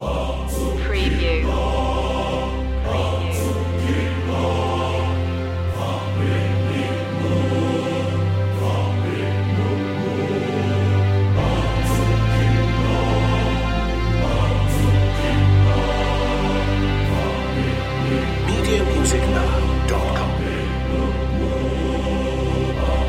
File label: string pad choir